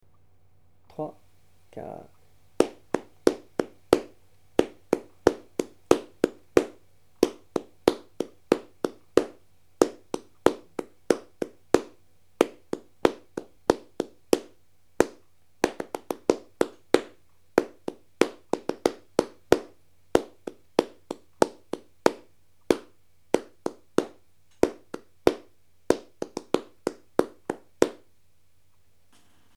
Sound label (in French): rythme frappe